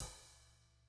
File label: CYMBAL, ELECTRO KIT